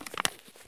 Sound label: tin can pickup; Escape from Tarkov